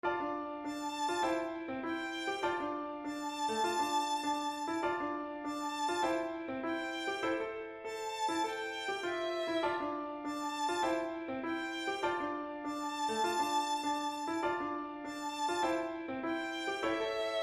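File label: strathspey, melody chords harmony; John Manns Strathspey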